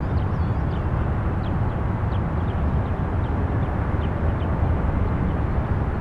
city surround, ambience; nimbus

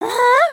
excited, emotes

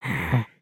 Idle Bobbing; Lego Strombie; creatures organic

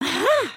camera picture; emotes

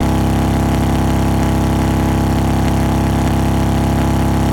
diff MOTO high, vehicles; aronwk collection; ndaudio

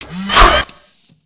Robot Attack Melee; Lego; creatures mechanical

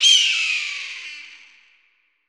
Hawk; gnarled forest

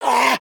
Crab Exert; creatures pets; Lego

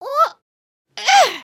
stretch, emotes

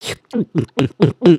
drink; emotes

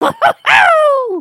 cheer

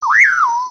Bird Amazon One shots; ambience; Lego

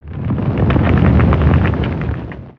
Spinjitsu Earth One shot

